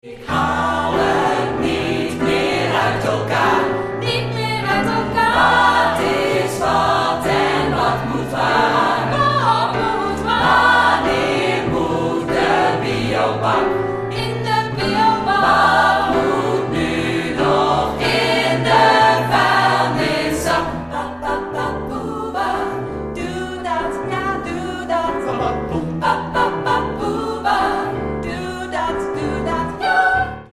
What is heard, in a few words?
satb